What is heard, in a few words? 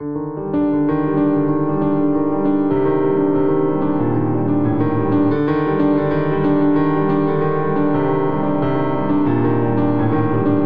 Ostinato Cinematic Grave G Minor 90 bpm, Grand Piano; MIDI Clips; Ableton